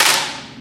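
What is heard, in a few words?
physics clipboard impact medium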